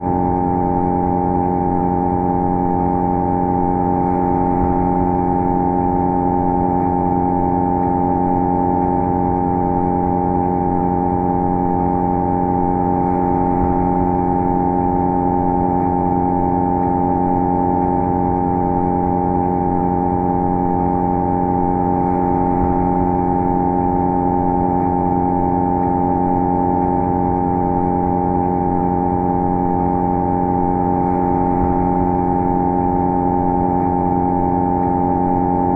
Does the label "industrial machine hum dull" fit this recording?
Yes